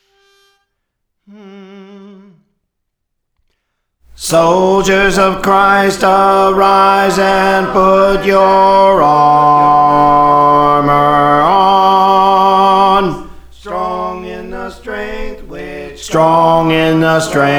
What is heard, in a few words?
Bass, Praise For The Lord Training